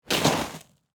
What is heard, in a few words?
Snow Crunch; Weather; Snow Sound Effects; Snow (Sound Effects:Weather); BlastWave